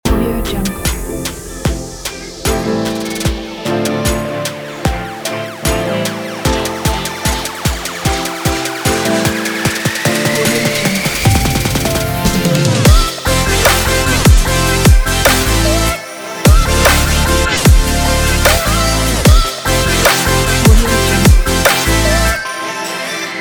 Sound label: future bass